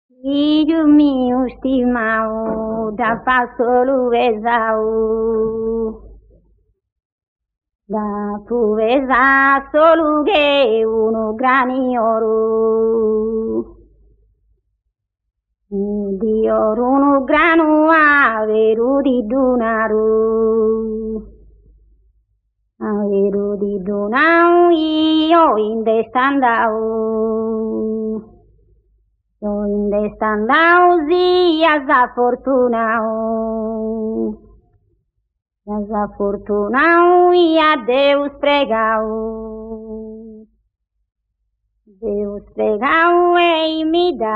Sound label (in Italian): canto a tenore; archivio rai sardegna; musica strumentale